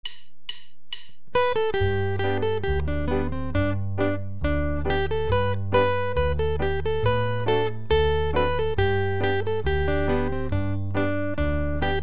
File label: turkey straw, guitar